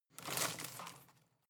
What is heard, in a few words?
home plant leaves rustle regular short